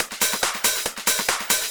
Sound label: Loops 140